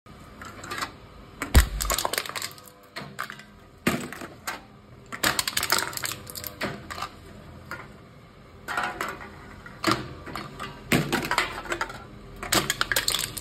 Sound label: Crushing a soda can; مچاله کردن قوطی نوشابه